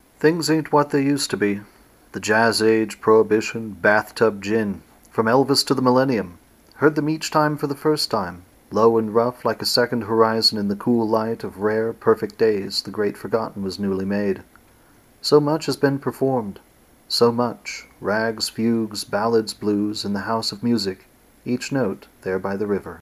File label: Music River